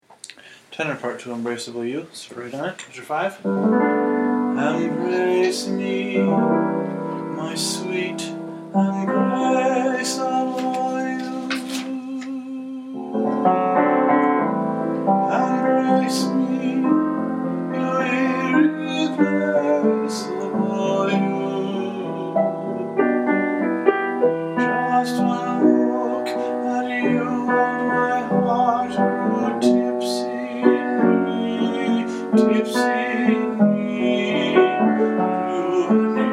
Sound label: tenor